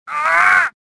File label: choke, worker; worker3